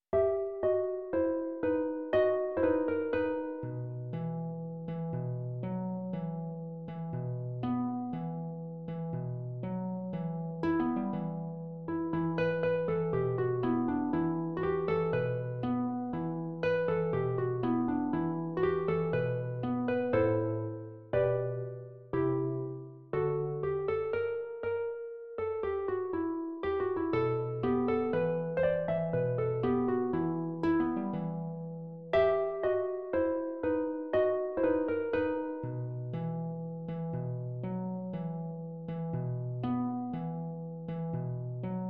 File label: Carols for Solo Harp